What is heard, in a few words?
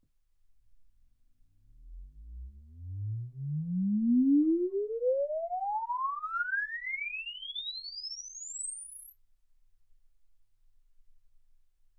exp swept sinus 10Hz 24kHz